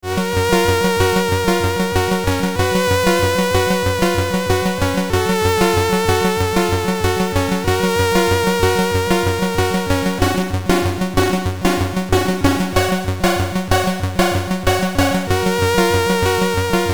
High Score (SID Stereo); Loopz; Commodore 64

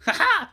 rage

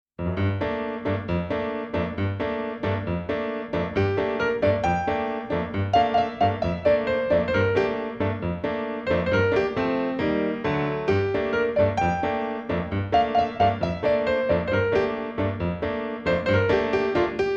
Piano Version; octopath traveler ii

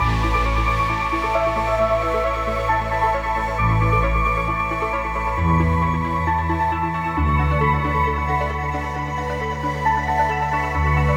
Music Menu; sayonara wild hearts 2019 gamerip

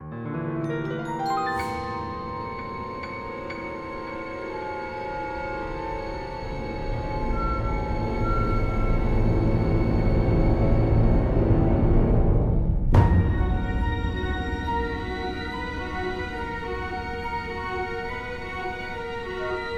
String; The Bureau XCOM Declassified

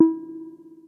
Menu Hover

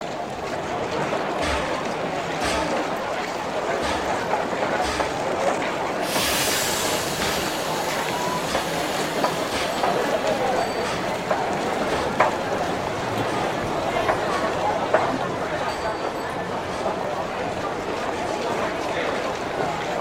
City Indust Large, Ambience; Civilization V

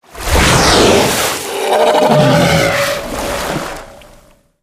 Sea Monster Surface

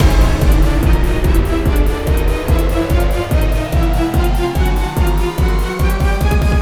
Stinger; Melting Chamber; Lego Movie Videogame

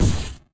Colorbomb Hit

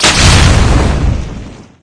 Weapons Shock Rifle

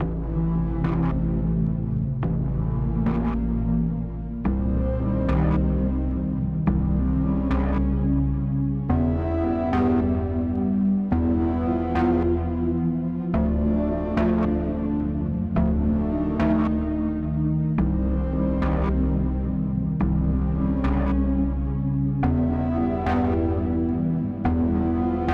Stealth Lead+Bass; Get Even; Red Midnight

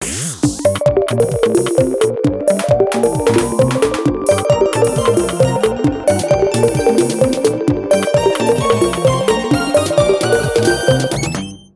Ghost BGM; Jetpack Heroes; LeapFrog Didj

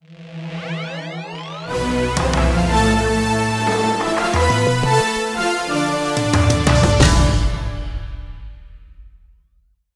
Demo Jingle; Sakurajima; Otogi Katsugeki Mameda no Bakeru: Oracle Saitarou no Sainan

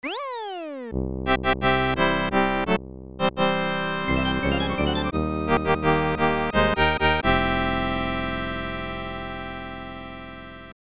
Boss Clear; smw remade from memory smw rom hack 2021

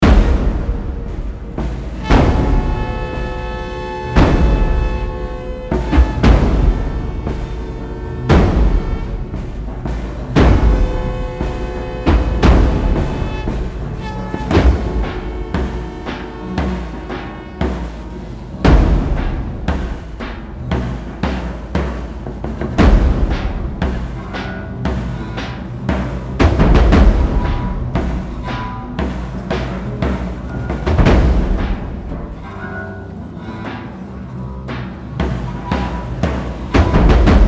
Intense Horn and Perc Full Loop

